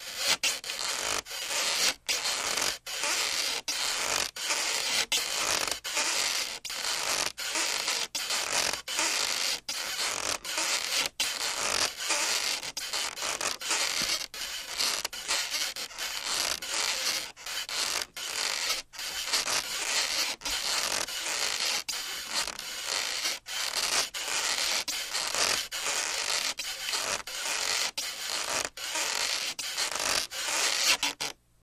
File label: Plexiglas Squeak